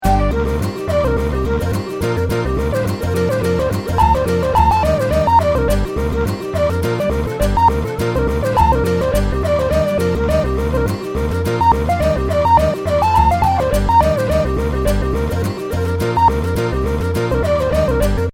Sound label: loop mono